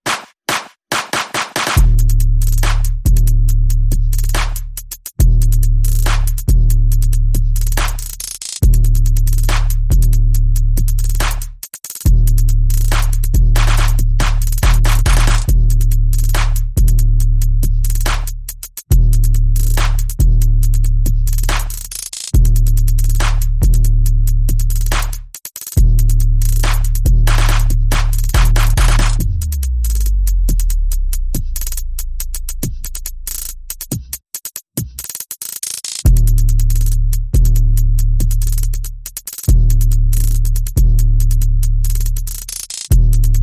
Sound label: Bass and Perc